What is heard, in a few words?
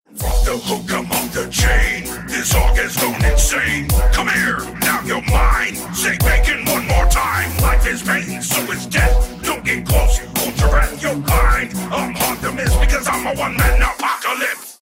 rap; Roadhog